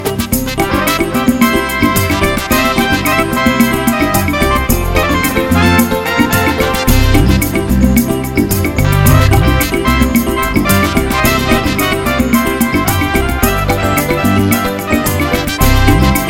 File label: A Great Samba